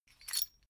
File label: metal chain movement single